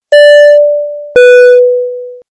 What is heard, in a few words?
Airplane Cabin call